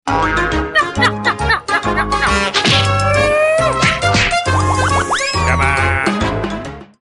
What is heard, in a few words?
ringtone; 3 stooges